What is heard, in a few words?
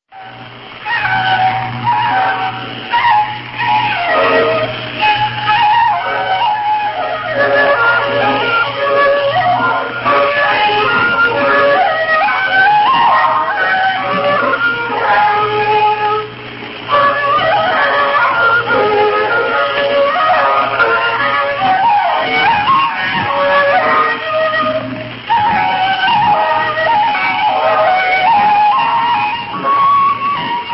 interval signal; new star broadcasting station